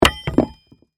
Tool Drop Wood, Industry, Tool, Drop, On, Wood; Hand Tools; BlastWave

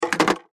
Technology Pay Phone Hang Up Telephone; Telephones; Electronics

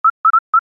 Computer Beep, Short; Multimedia; Stuart Duffield

